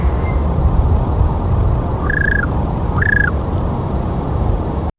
the quiet rustling of dry

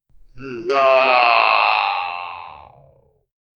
player death sound in rpg

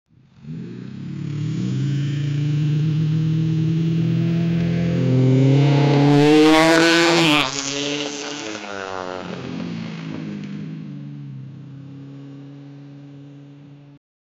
motorcycle moving very fa

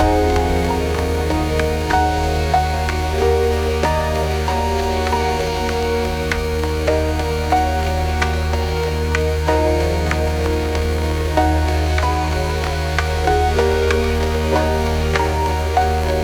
a late 90s hip hop sounds

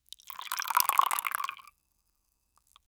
the sound of a thick, gloopy

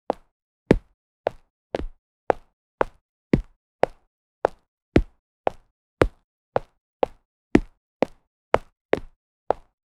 footsteps

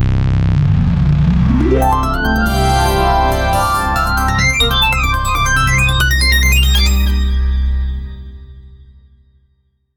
retro 1980s logo intro

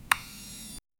xbox 360 blade dashboard, system

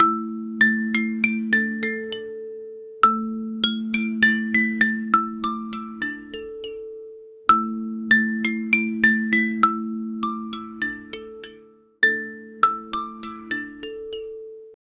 film soundtrack, instrumental

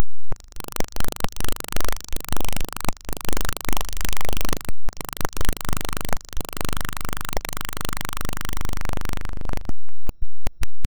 camera shake hard glitch; special effects

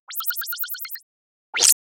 cartoon like sparkling; sparkle

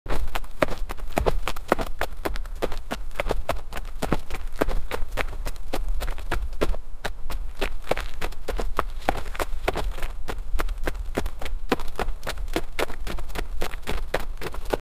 the sound of running thro, athletics

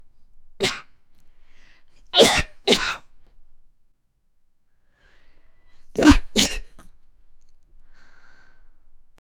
sneezes sound aaachoooo

